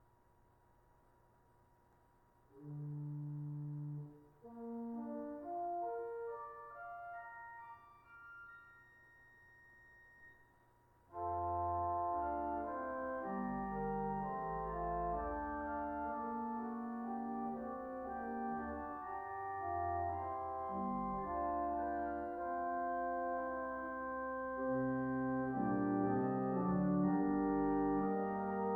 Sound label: swell octave, organ; 4’ Praestant; An Open Diapason of 4' pitch.; It is used in the principle chorus